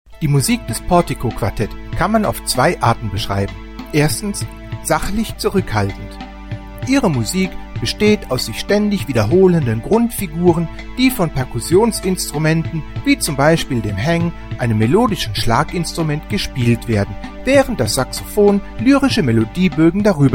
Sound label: Quartet